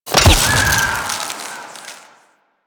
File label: Turret Land; Warframe; Corpus; Corpus Railjack; Admiral Agent